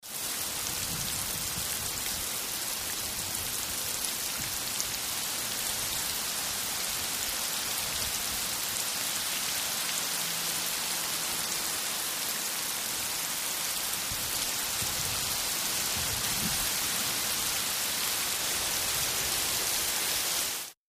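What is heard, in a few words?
Heavy Wet Rain Hard Surf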